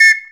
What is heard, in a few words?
Whistle 2 Note; Planet Earth; Digital Sound Factory Planet Earth